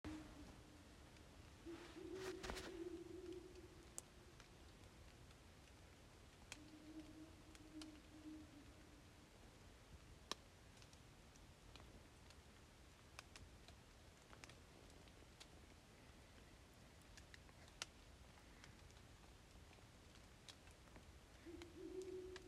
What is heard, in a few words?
Owls and rain